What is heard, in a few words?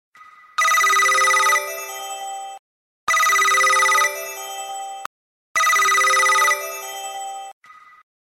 Mixed Phone